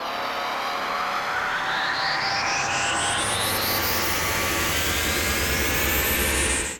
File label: dazzle Near win; fortunerangers